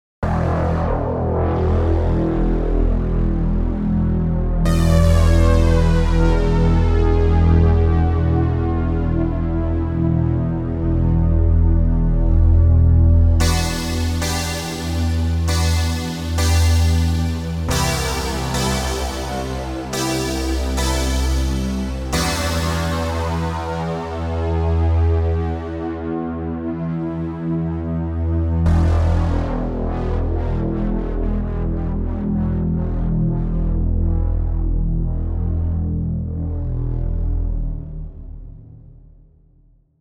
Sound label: Metal Billow